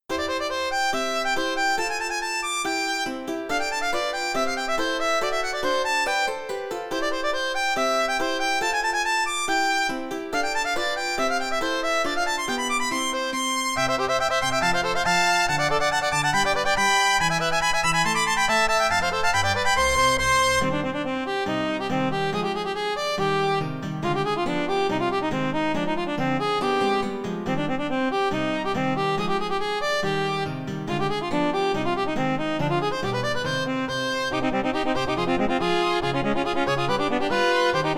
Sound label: CM500 MT32; ULTIMA6